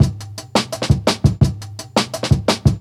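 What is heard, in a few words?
Jungle Breaks, 170 bpm; 90 s Sample CDs; Original Jungle Breaks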